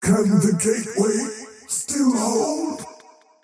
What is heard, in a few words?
death; dota; faceless void